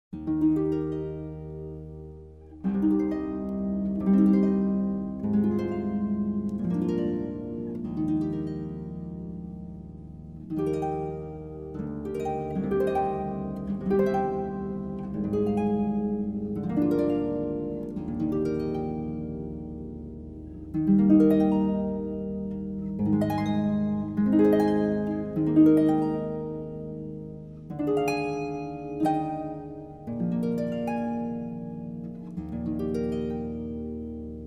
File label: Instrumental Harp Praise; Fairest Lord Jesus